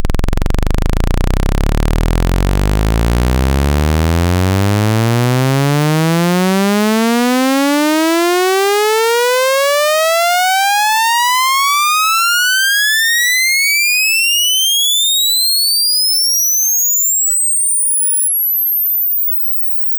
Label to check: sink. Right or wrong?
Wrong